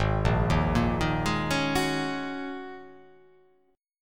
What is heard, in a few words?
Ab 7th Suspended 2nd Suspended 4th Ab down; Ab7sus2sus4 arpeggiated